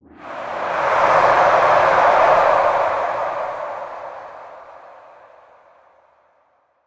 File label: Hype Swell; Sports; Tackleball